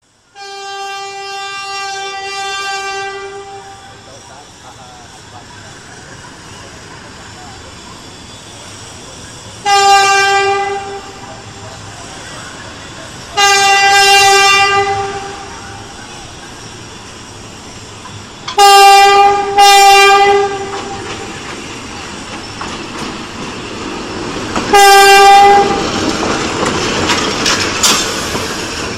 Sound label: Train